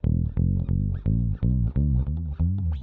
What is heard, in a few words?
round rubbery bass climb; MELODIC SAMPLES